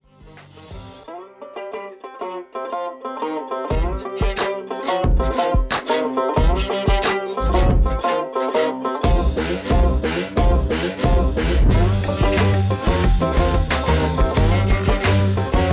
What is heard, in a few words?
Beauty Beats